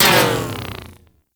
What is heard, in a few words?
Pull Grapple On; Warframe; Mag